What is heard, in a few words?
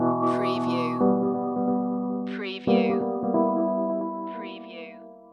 Hip Hop Dark Rhodes Licks 90 BPM Swing - E